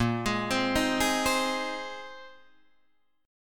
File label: Bb 9th Suspended 4th Bb Guitar Drop D, chord